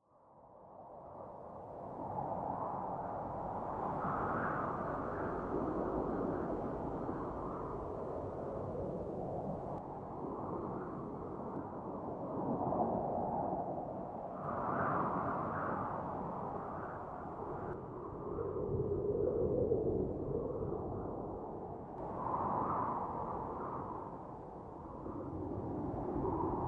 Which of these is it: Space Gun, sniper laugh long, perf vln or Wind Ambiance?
Wind Ambiance